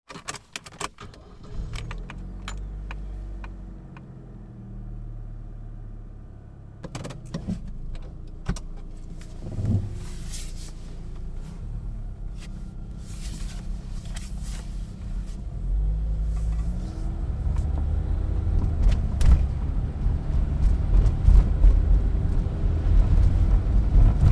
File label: car drive wet road start drive junctions stop daewoo nexia automatic; transportation; sound effects